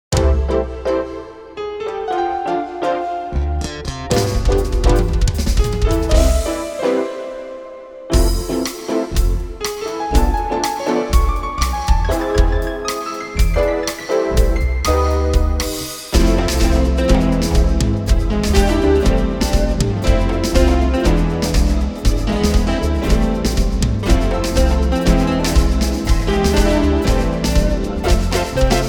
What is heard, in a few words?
Samba